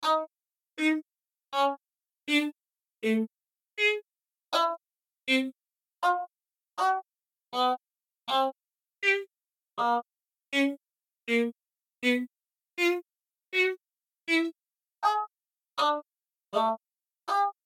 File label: voicy String